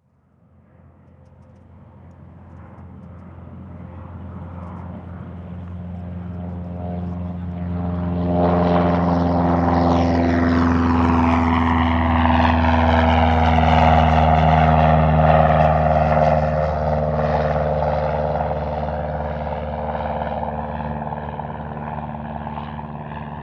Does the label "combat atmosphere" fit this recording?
Yes